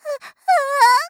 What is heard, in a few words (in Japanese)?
あ…あぁっ, ショタ